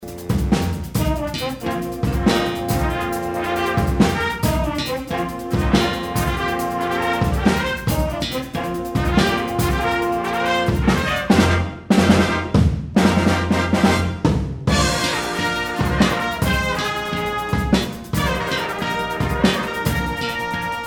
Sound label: Brass Machine